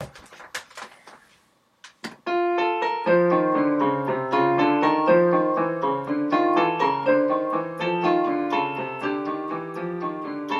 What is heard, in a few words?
six notes in bass; saints